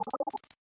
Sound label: Comm Notify; Bungie